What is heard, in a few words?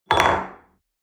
espresso upset table medium short